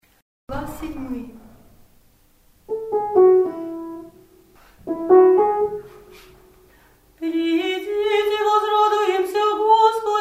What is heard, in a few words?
glas 7, singing Stixir; church